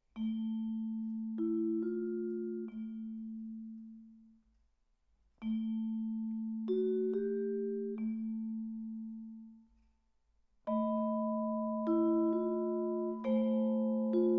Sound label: saxophone and percussion andante moderato